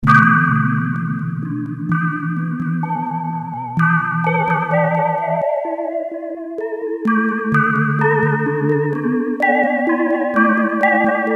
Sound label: kawai k1 decay fx